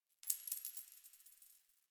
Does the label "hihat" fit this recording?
No